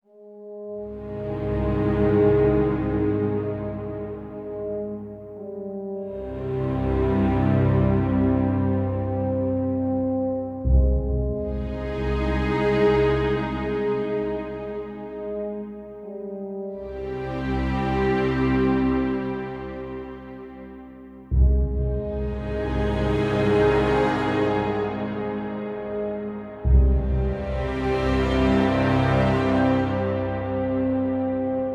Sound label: music solemn and grave; freelancer-hd-edition